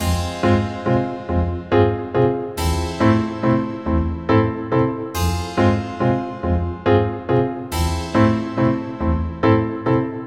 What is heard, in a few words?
Elevator; Toontown: Corporate Clash Soundtrack; Bossbot